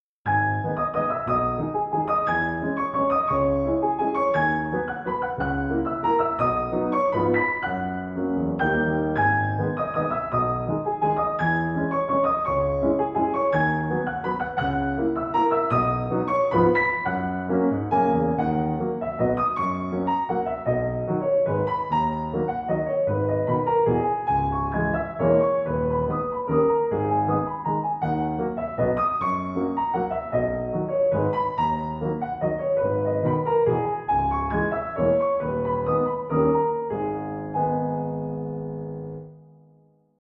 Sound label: Waltz No 9 Op 39; Johannes Brahms (PD)